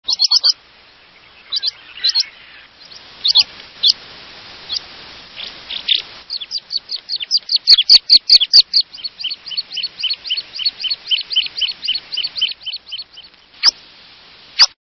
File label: American Pipit